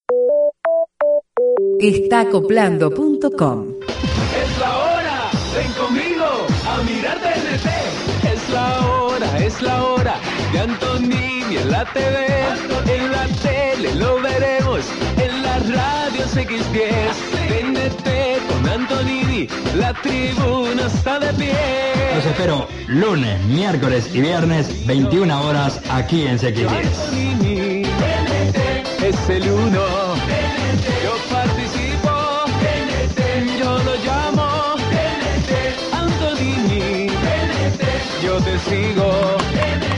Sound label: Jingle